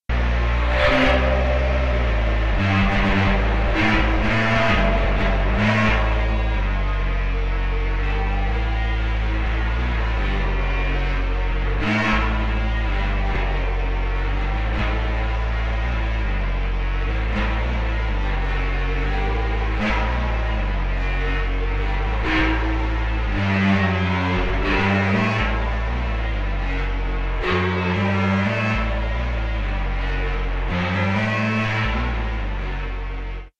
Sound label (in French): muezzin, flute a bec